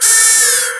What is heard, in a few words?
ALERT; DEMOUK